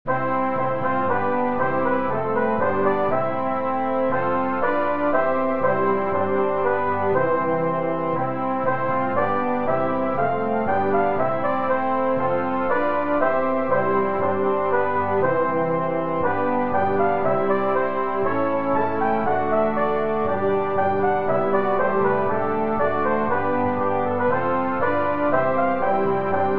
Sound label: Hymn